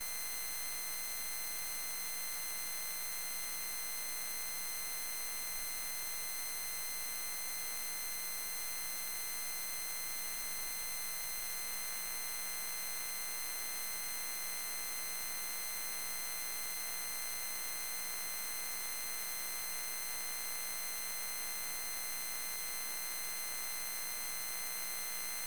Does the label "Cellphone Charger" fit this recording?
Yes